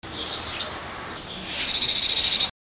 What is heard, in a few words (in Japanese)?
shijyuukara